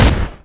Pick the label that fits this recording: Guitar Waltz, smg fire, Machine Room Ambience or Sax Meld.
smg fire